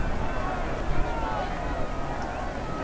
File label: QCROWD; LEGEND98